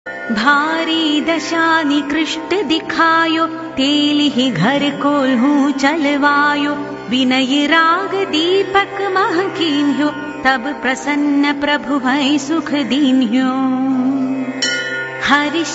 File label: shani chalisa; deities